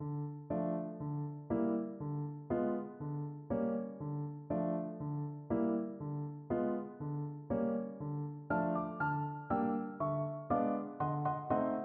sugar plum piano duet; tchaikovksy

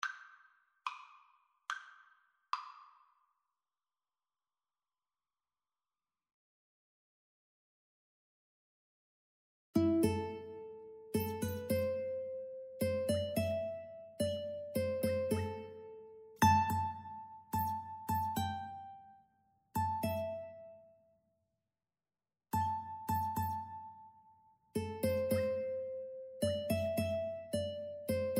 House of the rising sun, 2 ukulele

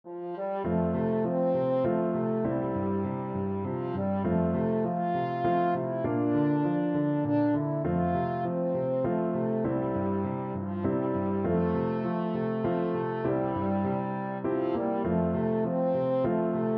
french horn

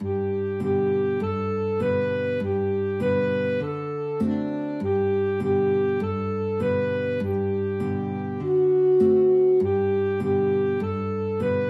alto sax guitar, alto sax-guitar duet

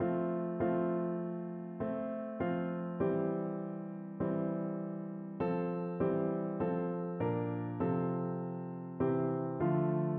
o come, piano

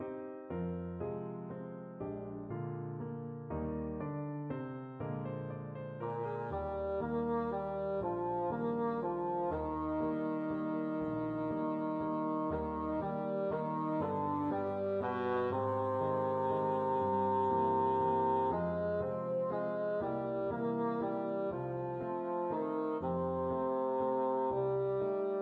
bassoon

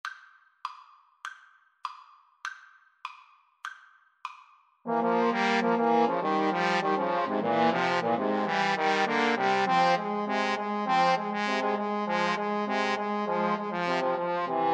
Trombone Trio; thats a plenty

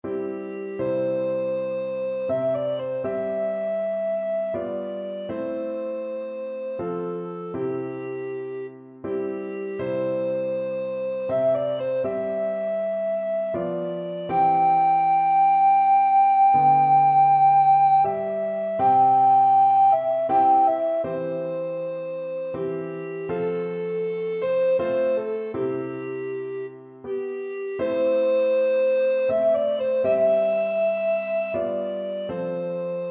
amazing grace, alto recorder

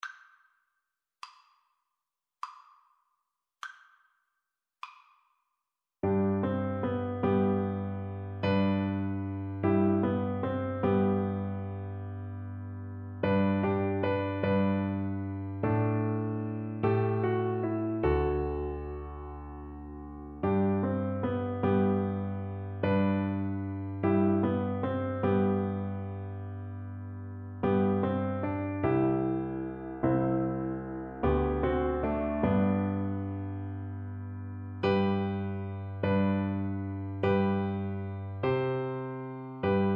blessed assurance, oboe

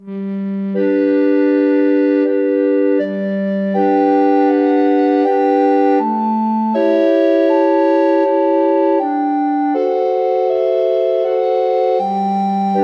aura lea, recorder quartet

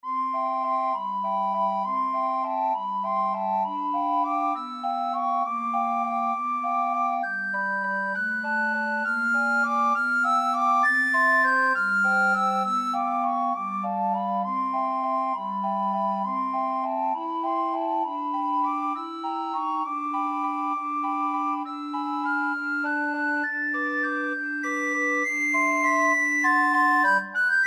waltz, recorder quartet

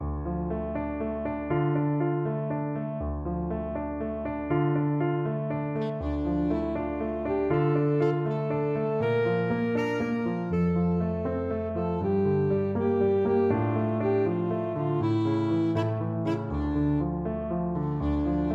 saxophone; Vltava

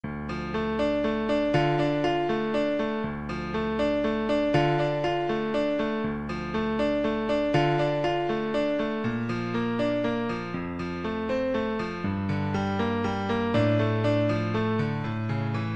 Vltava, french horn

